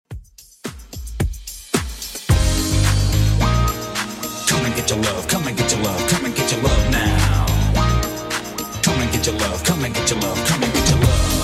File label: 80s Redrum 110bpm